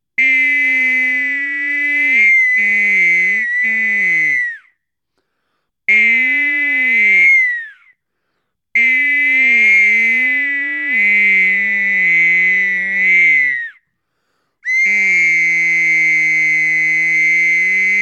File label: solo